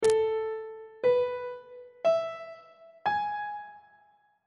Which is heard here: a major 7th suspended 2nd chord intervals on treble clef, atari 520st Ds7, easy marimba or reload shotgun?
a major 7th suspended 2nd chord intervals on treble clef